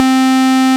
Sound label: BS MINI, Synth Bass, Analog Bass; Roland L-CD701; Synth Bass 2